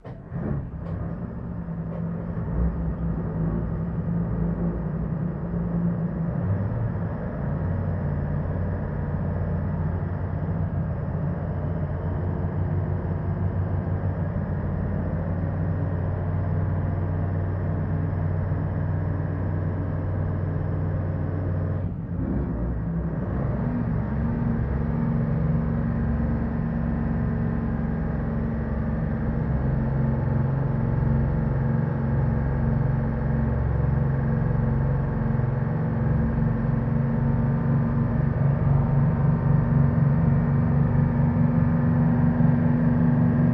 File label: Military Pbr: Onboard Start-up, High Idle, Steady. Dual